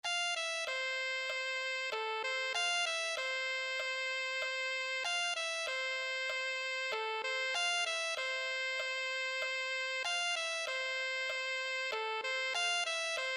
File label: alto saxophone